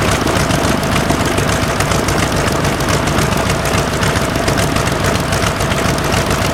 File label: zero side, engines; War Thunder